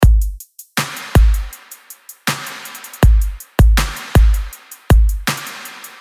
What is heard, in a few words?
FULL BEAT